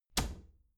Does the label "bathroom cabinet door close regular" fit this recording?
Yes